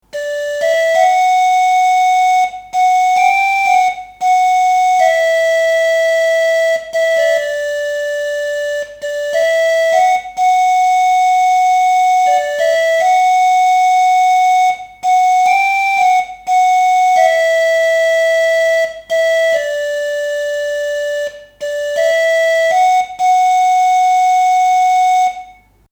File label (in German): Horn Drehorgel